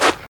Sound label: sand footstep; Too Many Stones